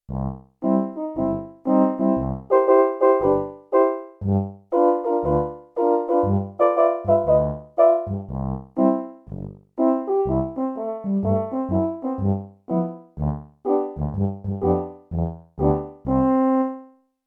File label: Tuba Horn Split; Yamaha TX16W